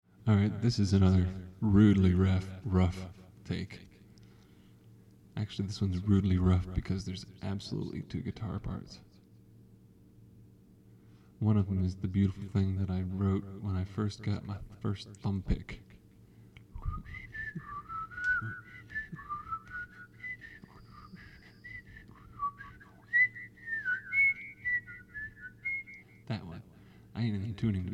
country rain; navigating

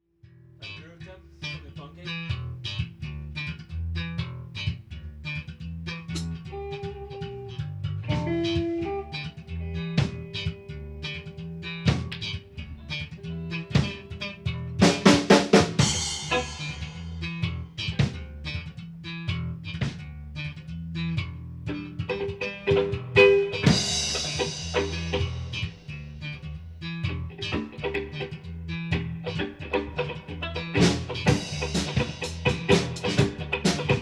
tangerine cowbells in my lawn

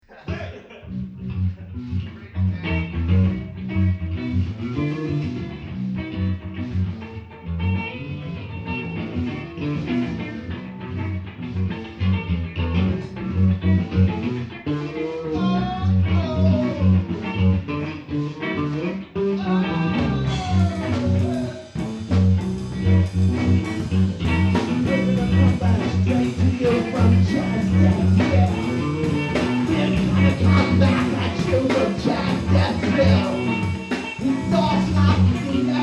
jazz death (coda)

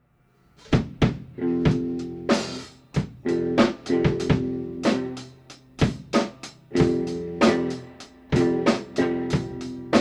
stomped box; stratospheric-metamorphisis

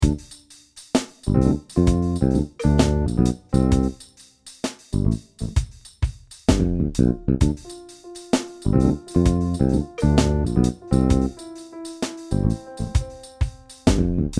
mile long piano

